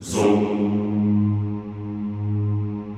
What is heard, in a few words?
ZOOMMM, Extended Classical Choir; Best Service